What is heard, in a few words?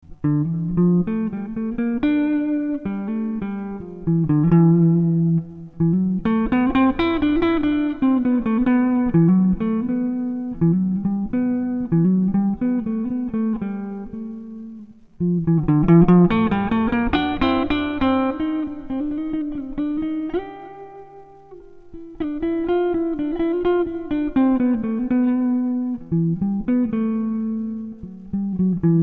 Jazz